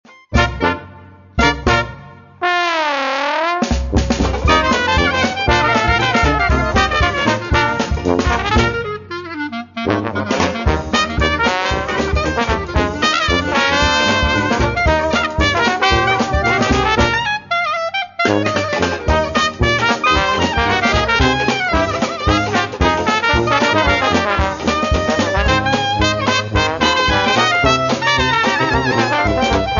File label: Dixieland One Step